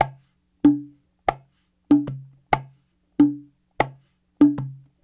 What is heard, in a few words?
Cahon